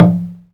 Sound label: Wood MPC, One Shots Drums